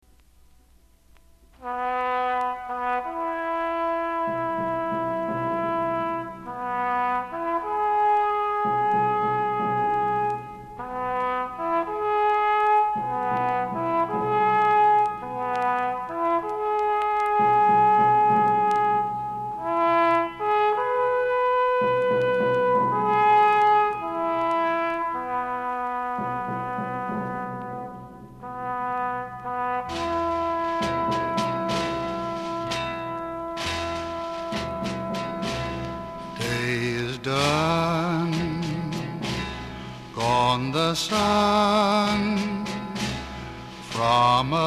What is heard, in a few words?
Taps